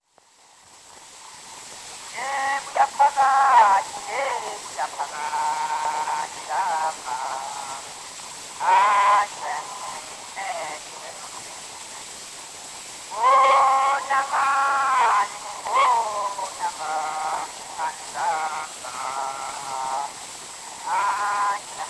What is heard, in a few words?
Zulu Recordings; Father Franz Mayr; Ihubo lenkosi